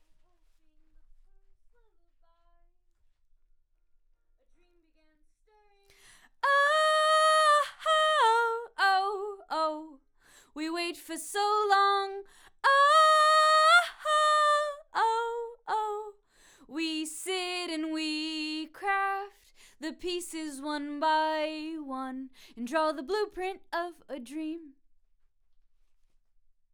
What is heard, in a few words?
Vox start new